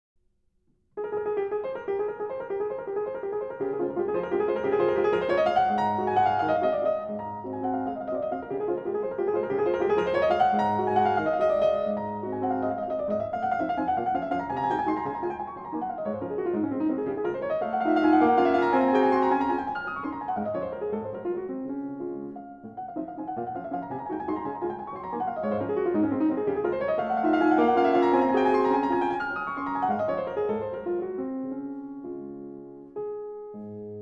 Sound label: waltz in d flat major